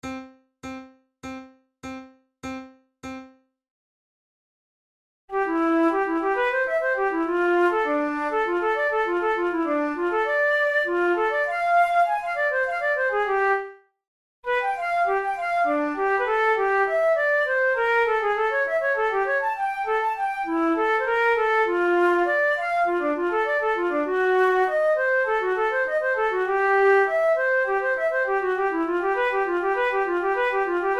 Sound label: duets, flute; forty progressive duets